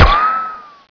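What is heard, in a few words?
ricochet, weapons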